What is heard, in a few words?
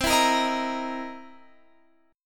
C 7th Sharp 9th C Guitar Open F; C7#9 strummed